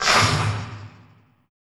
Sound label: FEARFUL, FILM PERC; Drums In Your FACE!